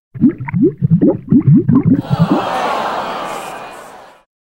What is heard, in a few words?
wrong